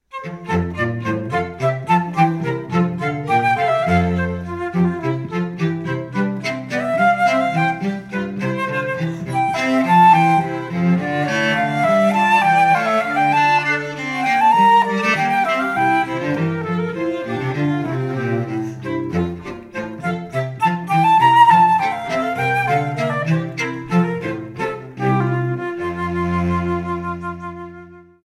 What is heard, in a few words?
Bouree trio